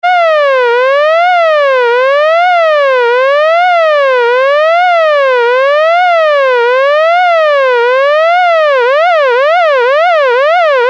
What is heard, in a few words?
Broken Siren